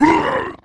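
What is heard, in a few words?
Dinosaurs; Dinorsaurs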